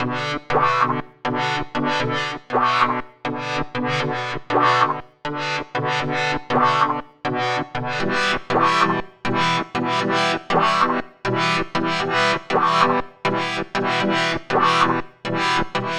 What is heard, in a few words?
Power Modulator; Sonic Foundry (Sony Creative Software); Club Joints and Street Anthems; Drop Top